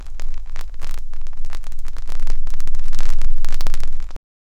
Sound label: gunfire on metal surface, battle